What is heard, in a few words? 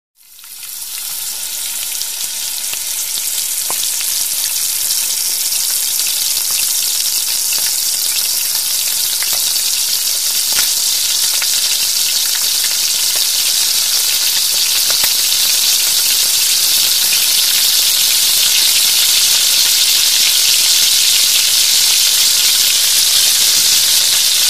Frying Food Sound Effect